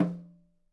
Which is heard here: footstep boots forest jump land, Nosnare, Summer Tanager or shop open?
Nosnare